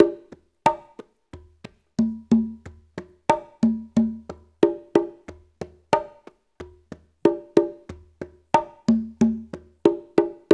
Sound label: CHA, African Contemporary; Spectrasonics; Supreme Beats